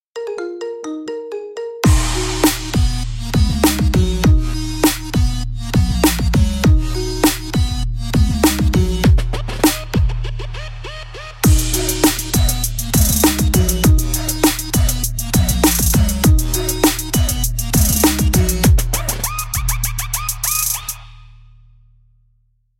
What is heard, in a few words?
Marimba Remix